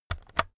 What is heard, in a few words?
keyboard external key press slow